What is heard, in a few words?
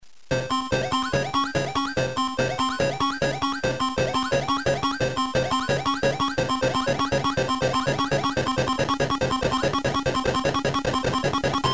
sonic warning